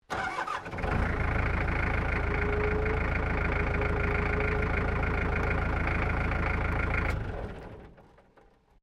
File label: Bulldozer Startup Shutdown; Industrial machinery; Construction